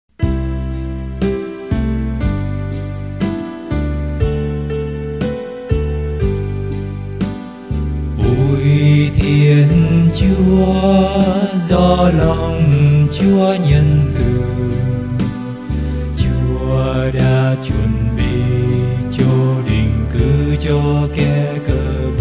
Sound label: bass